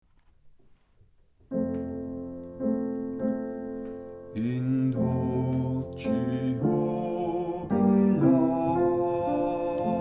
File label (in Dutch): alt in dulci